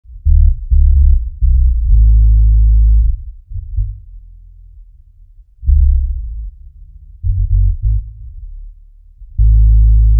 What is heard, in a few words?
NEW ROOTS REGGAE; Sonic Foundry (Sony Creative Software); Drums from the Big Room The Mixes; Demo Projects